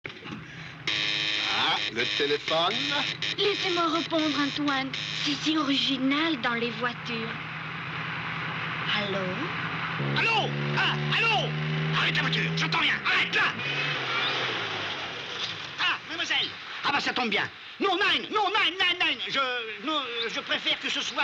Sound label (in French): telephone; corniaud